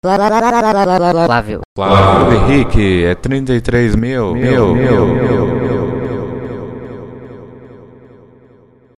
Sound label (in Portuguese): vinheta